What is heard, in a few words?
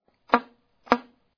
slap, sax